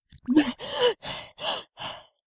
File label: female air, Human Female Water; zps; Humans